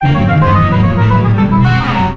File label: Level Failed; luigis mansion dark moon; 3ds switch; Tracherous Mansion